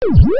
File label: power; pacman